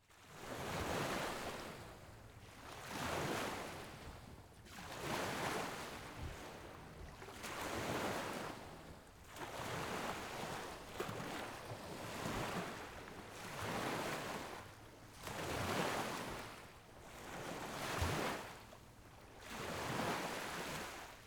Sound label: Liquid Water Ambience Lake Shore